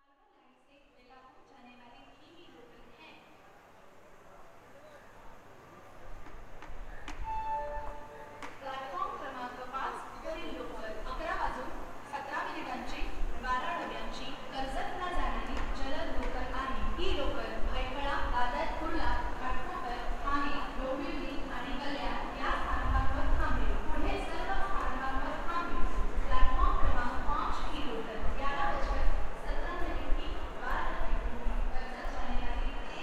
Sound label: Mumbai Trainstation; The streets are crazy loud and busy.